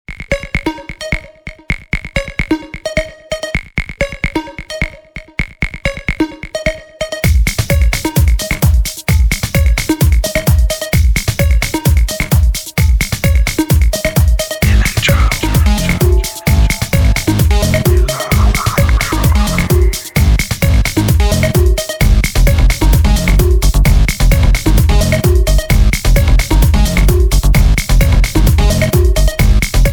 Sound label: we love electro